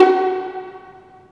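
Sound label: Violins Pizz; STR; Roland L-CD702; Vlns Pizz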